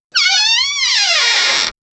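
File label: sci-fi style electric slide, doors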